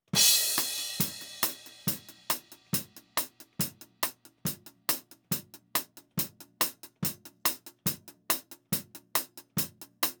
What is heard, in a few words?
Hat